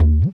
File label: EQ Perc, Percussion Mix